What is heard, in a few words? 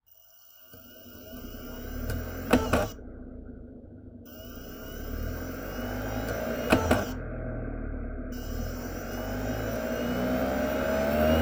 Spin Up, DVD Drive Internal; DVD Drives